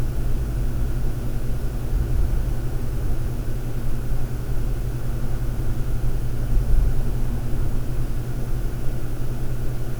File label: 1000 RPM